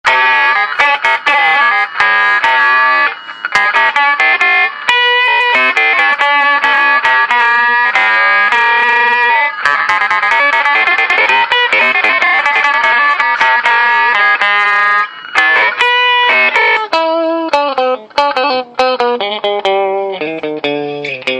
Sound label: ring mod; custom kit